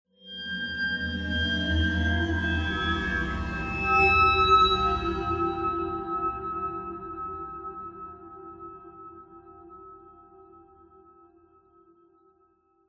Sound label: amb startup metal; Half Life Alyx